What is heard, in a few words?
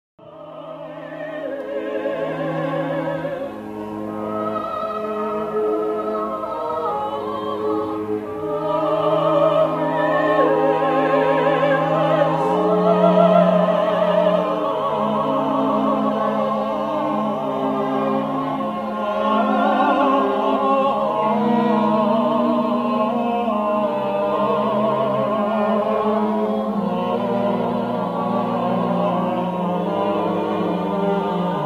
Lullaby